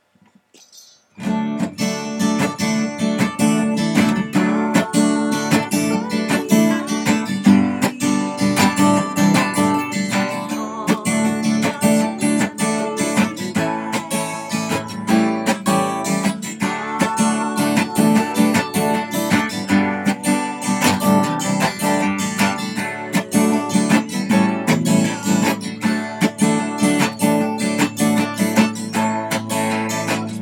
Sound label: what a friend guitar